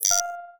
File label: Stealth Surveillanc Sci Fi UI